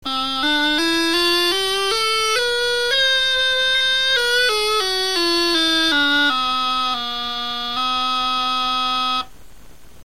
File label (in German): gaitachanter tonleiter